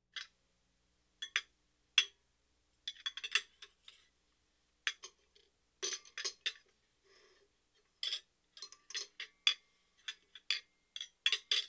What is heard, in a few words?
Household Phones